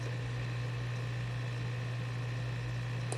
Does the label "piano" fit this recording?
No